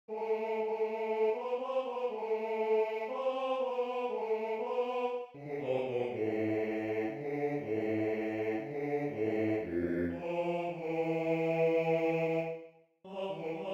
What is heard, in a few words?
Bass II; Varda che passa Bella Bimba